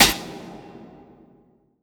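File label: snare drum, drum & bass, 150 BPM; Ueberschall; Drum & Bass Resonance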